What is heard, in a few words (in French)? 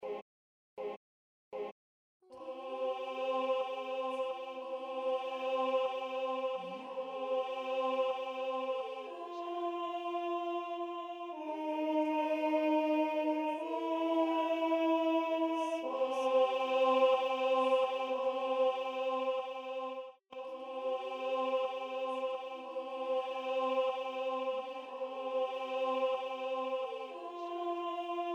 chorale alto